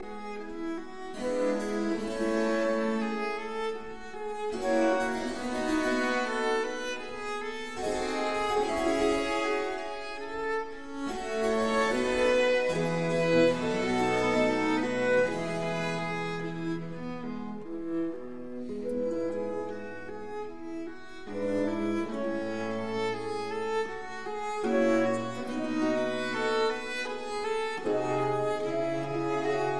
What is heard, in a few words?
Quatuors Quartet Adagio; No 6 in D minor; TWV 43d2